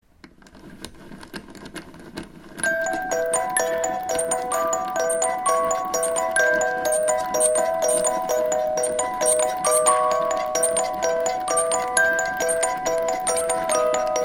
this is the musical box yes i know it has wrong notes